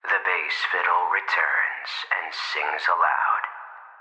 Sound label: Puzzle Diary Bass; Sammy